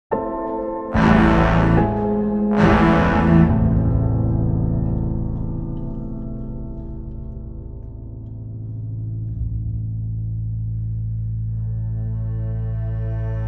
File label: Underscore, Classical Cinematic; Narrative Mind